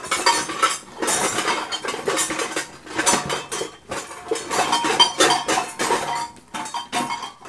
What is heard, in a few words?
PRC INSTR, Long Perc; Rhythm Section; FX Perc